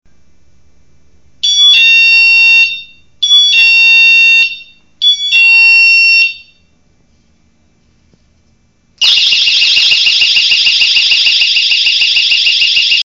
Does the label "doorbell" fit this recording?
Yes